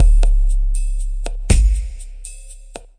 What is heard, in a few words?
80 bpm, swinging verby bigbass clicker, drum loops and breaks